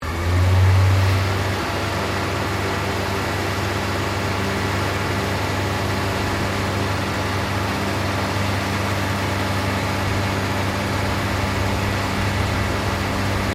Cement pump cleaning cycle, Industrial cement pump; Construction Vehicles